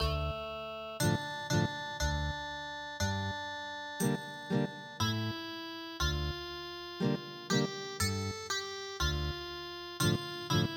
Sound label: Ethnic Guitar, traditional